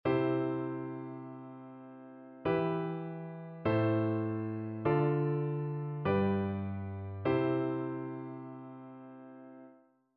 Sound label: harmonic dictation; aural skills i